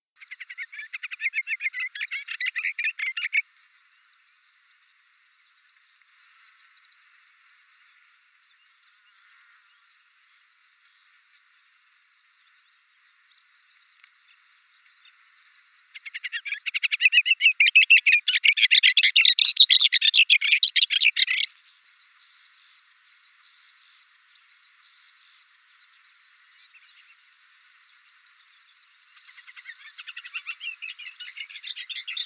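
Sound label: severnaya bormotushka golos, booted warbler voice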